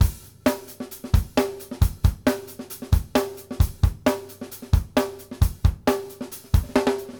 basic B, drumworks

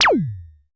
zap, Roland MC-909

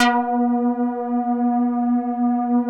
LEADS; Masterbits; Vintage Voltage

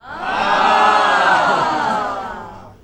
AAAH; ClapsOohsAahs; SFX

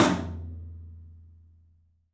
TOM, Studio Toms; 3D Percussives

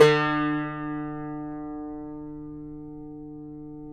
cumbus; Roland - LA Composer vol. 3

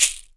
SHAKER AFR, Drums; SHAKERS; Big Fish Audio; Ross Garfield Drums VOL-2

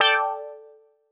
Quart Stab A; PowerFX - Nu Jazz House

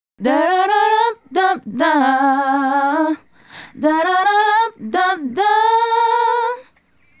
Female Group, Hip-Hop and RnB Vocals

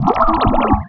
FX, attacks

